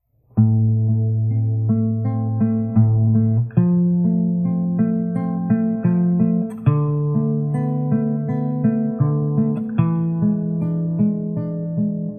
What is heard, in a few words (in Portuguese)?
violao andante religioso; Carcassi